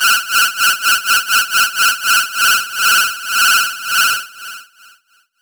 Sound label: Screecher; Zero-G; Soundclash Nu Dance Electro; Acidized Wavs; FX Atmospheres